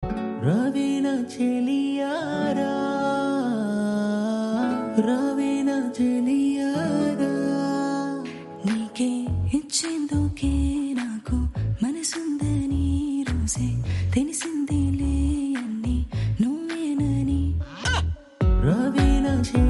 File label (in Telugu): telugu version